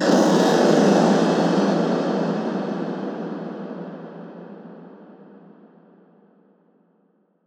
Atonal Depths, Psytrance

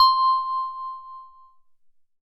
Oberheim OB-8 PLUCK Bell High - C; Synth; One Shots; Cymatics; Fantasy Synth Sample Pack

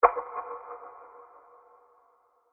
Impact FX; Cymatics; Orchid Sample Pack

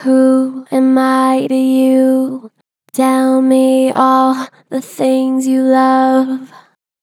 Pitch Flat Gentle, Vocals; Harmor Vocals

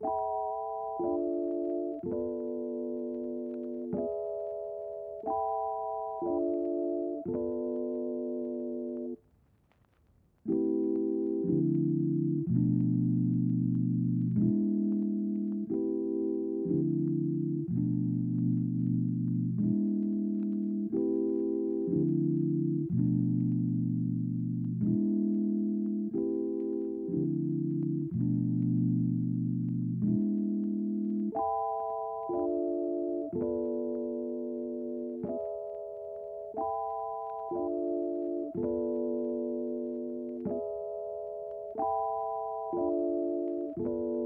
92 BPM A Min Warm Rhodes, RnB; Melody; Stems; Cymatics; Cymatics - 2022 Melody Collection (BETA); Paris

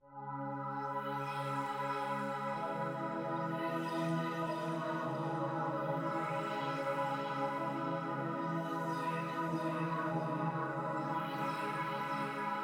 95 BPM C# Min Pad; Melody Loops; Ambient; Arrival; Cymatics; ANALOG EVOLUTION Beta; Ambient Collection

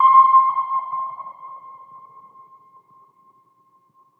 Prophet 5 PLUCK Sonar - C; One Shots; Cymatics; Fantasy Synth Sample Pack

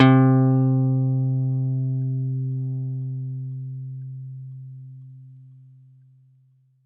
Acoustic Guitar Pluck, C; Instruments; Guitars; Cymatics; Light Free Sample Pack; Bonus Samples

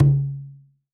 Conga - C#; Percussion; Cymatics - Infinity Beta Pack 2.0; Zeus Percussion Collection

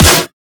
Snare, Dubstep Drum Shots

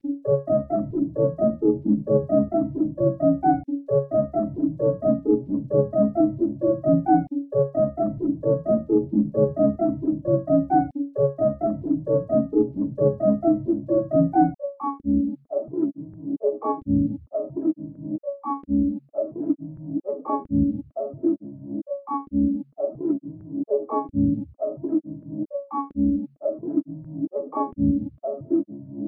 132 BPM C# Min Arp, melody loop stem; Trap; Loop Stems; Glock

